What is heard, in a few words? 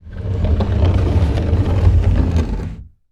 Scrape Slide Wood; SlidingBookcase